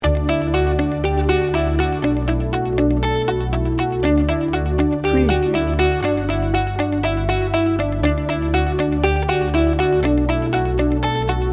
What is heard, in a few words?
happy glow upbeat positive no drums